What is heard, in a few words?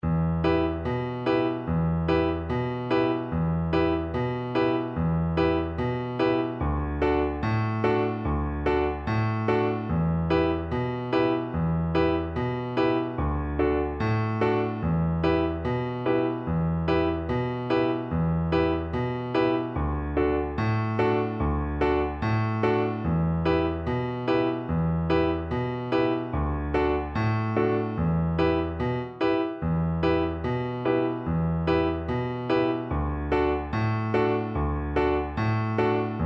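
recorder karaoke; drunken sailor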